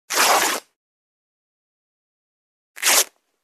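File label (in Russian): звуки разрыва ткани одежды. рвут ткань.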